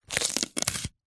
pepper single chop with knife on wooden chopping board; zapsplat